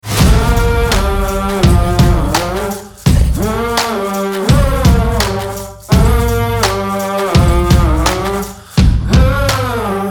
indie rock; moseca

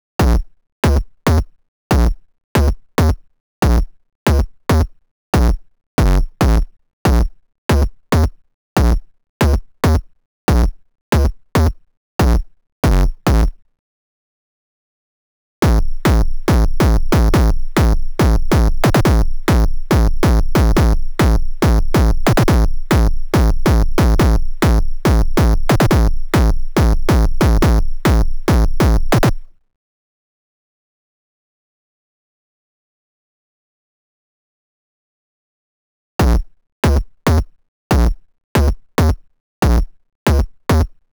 kick

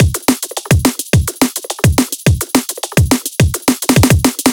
Drum _ Bass Full Drum Loop - 174 BPM; Cymatics

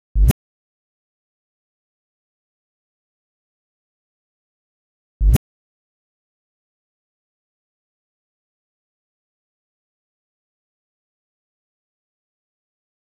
bass swipe

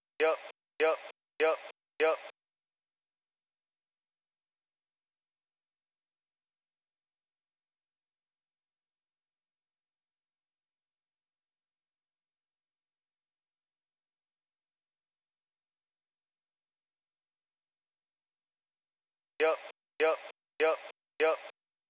100 bpm VOCAL yuh

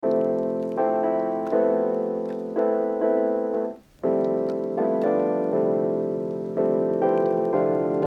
lofi hiphop piano

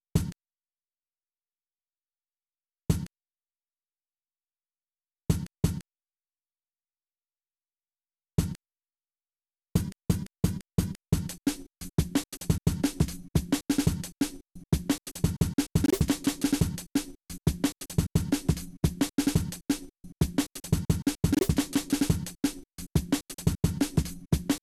Breakbeat Sample Oldschool Breakbeats; Philly Nice; Breakbeat Paradise

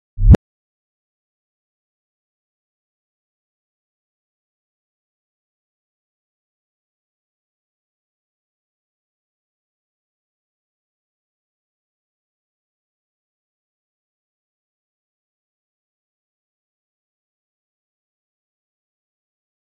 wwooooh bass

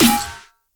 SNARE; moderately sized boy

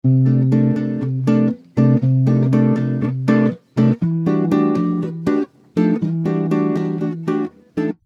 Guitar house chord

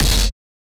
CRASH mini crashy thingy

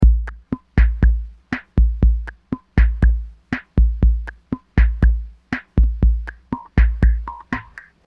drum bossa modern

